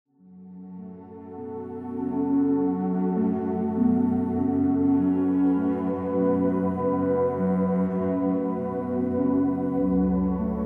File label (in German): Klangei; klangei next somnia set